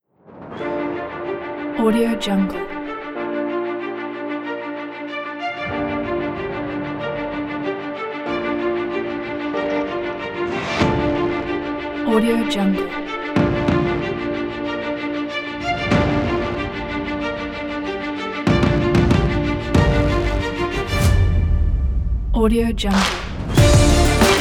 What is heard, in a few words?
dramatic sport